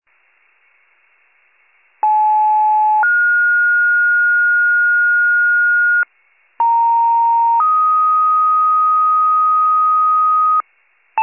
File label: fire, pager alert